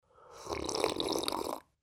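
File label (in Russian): звук хлебания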